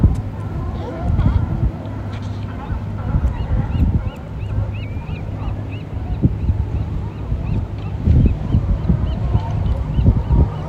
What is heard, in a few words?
Fishermans Wharf SF